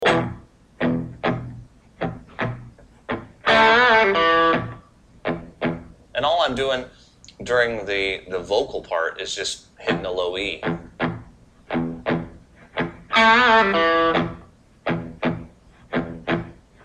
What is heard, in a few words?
catfish blues style lick